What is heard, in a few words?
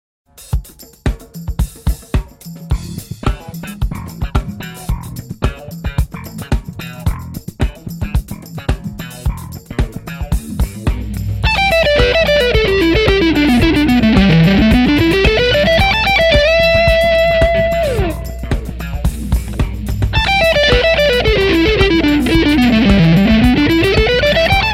lick em7, guitar